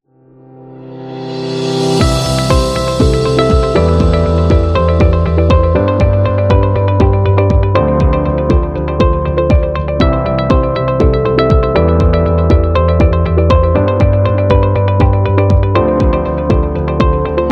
Corporate Promo

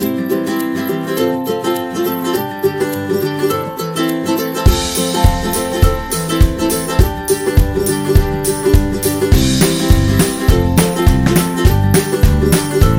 Positive Folk, happy music; happy music vol 2

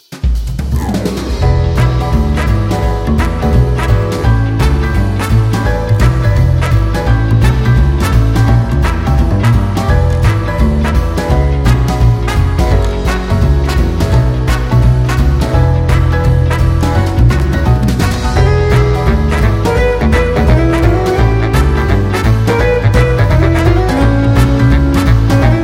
Jazz; jazz club

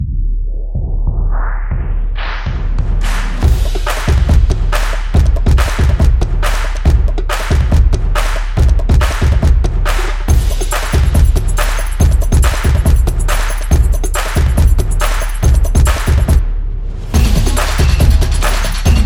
Football Action, percussive

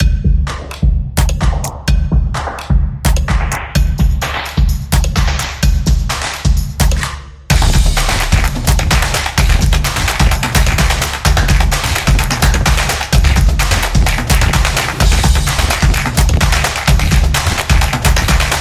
Skateboard, sport drums

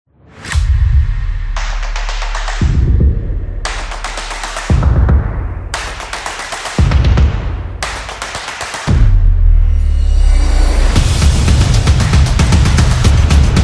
Stomps; casual game

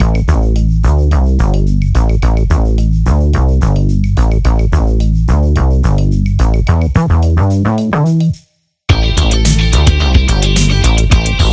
Funk

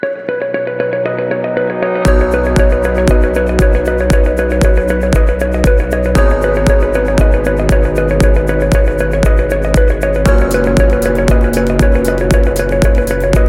Business Presentation Corporate, corporate music; corporate music vol 19

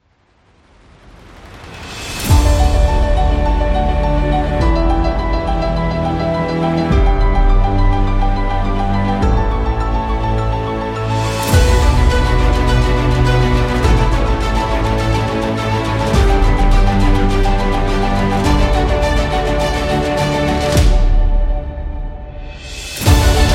Cinematic Trailer Epic; planetarium

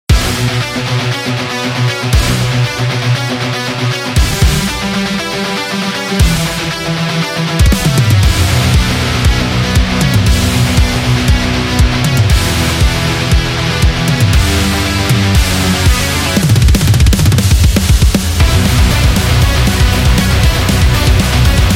Power Metal

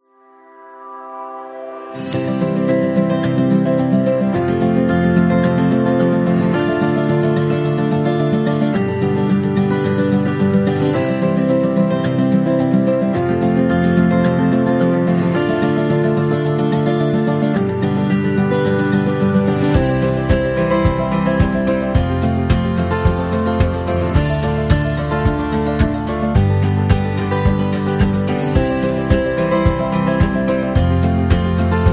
Acoustic Guitar Inspirational; happy music vol 9

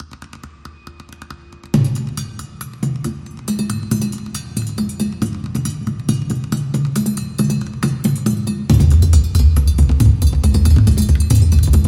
African Percussion

